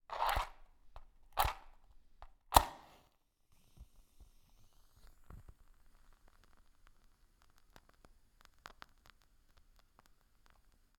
Candle

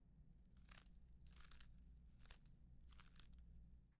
Sewing

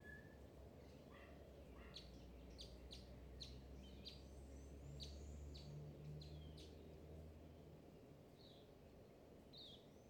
Birds In Park Ambience

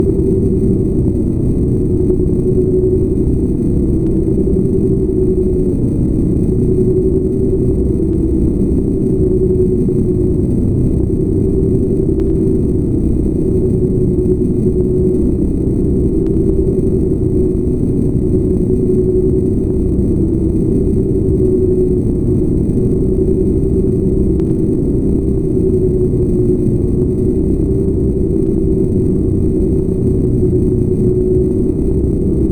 Synthetic Spaceship Stone Moving Loop

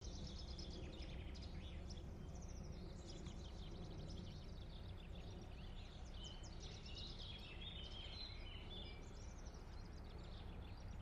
Bells

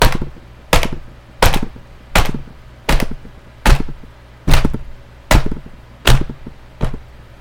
Hard Hits

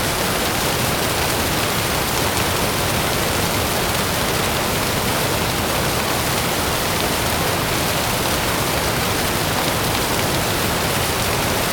Waterfall Saas Fee Watermill

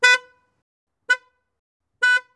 Car Horn C3

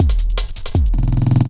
Ultra Dnb Loop 160bpm

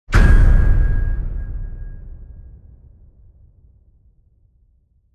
Powerful Metal Impact

